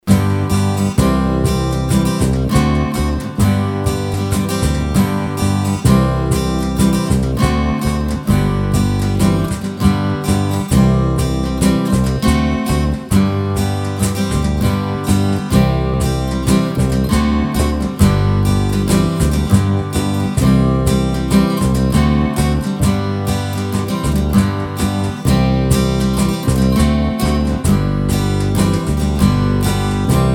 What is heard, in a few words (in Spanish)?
Pista Instrumental sin melodia